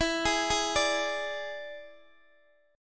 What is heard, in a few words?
E 7th E down Guitar Open D